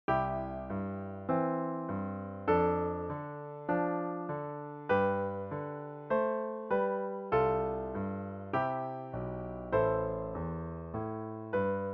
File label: piano